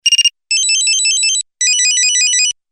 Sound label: Beep, phonetones; Alcatel OT-808